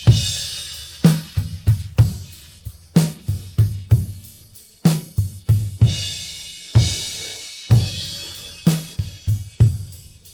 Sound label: pattern, drums